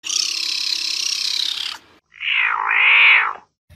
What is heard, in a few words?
Caracal; سیاهگوش